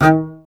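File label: STR CBS, Solo Instruments, double bass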